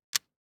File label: DICE on Felt, Grab, Standard, Three Dice; Samples; ArticulatedSounds; DICE Sfx Party (Extended)